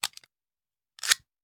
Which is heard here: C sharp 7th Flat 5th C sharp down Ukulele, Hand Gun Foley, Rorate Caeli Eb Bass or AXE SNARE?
Hand Gun Foley